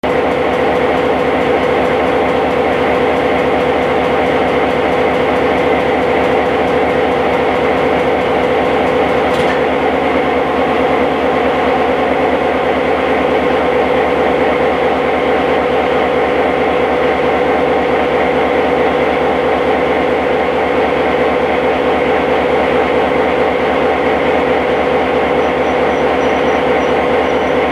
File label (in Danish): my1101 tomgang, toglyde